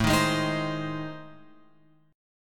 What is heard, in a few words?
A Minor Major 7th Flat 5th; AmM7b5; {5 6 6 8 X 8} strummed